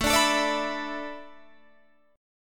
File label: A Suspended 2nd Suspended 4th; Asus2sus4; strummed